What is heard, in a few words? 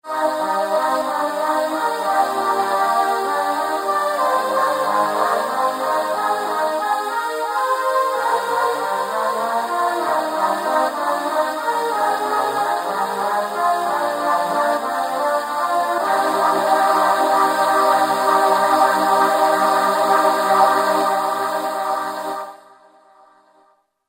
v-synth ah delay chorus